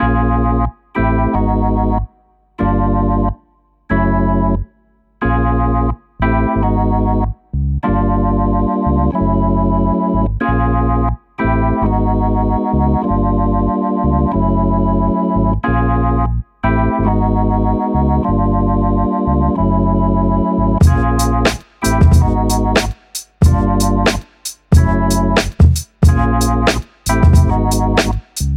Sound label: Soul Instrumental